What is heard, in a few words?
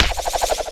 book spin; HeavenStudio; cheer Readers